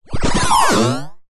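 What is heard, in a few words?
science fiction cyberpunk robot surge power short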